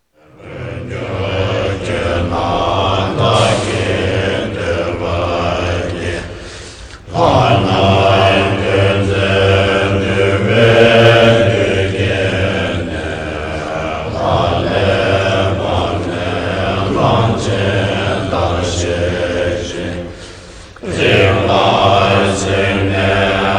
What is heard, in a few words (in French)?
chant moines tibétains